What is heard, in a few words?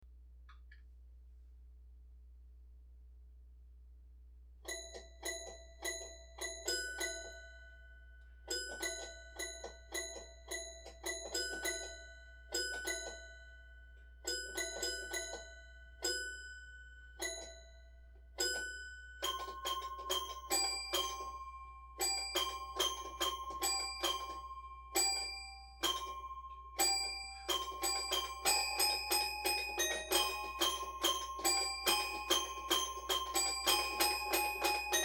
Plunk, Toy Piano